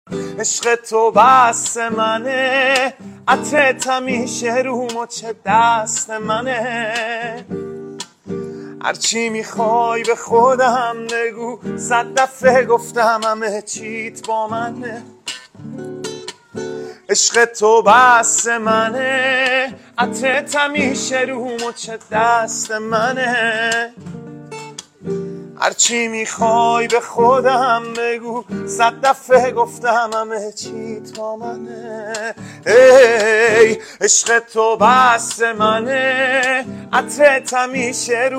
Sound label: Guitar